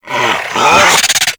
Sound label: Infested Charger Run Breath; Warframe